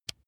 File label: razor attachment remove